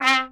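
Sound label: Piccolo Trumpet staccato C3; DirectWave; Varazdin Orchestral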